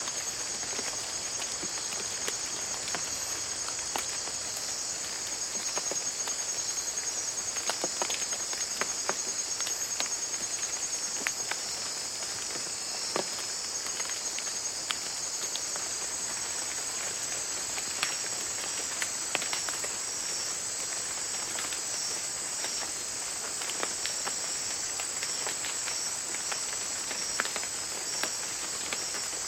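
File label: splash of a small waterfall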